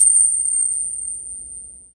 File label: Pin Chimes; Roland BK-5; Percussion